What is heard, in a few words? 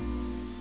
llama